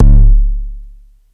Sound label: Kick; Ableton Live 12 Lite; Core Library; Drums